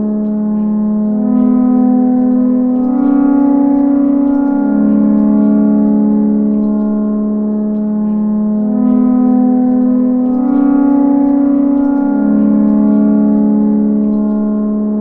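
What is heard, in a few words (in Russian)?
зацикливание темы сирены на синтезаторе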